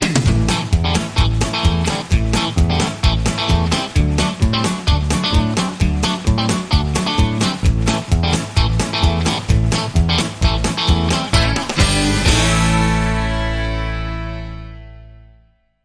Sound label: Country Pickin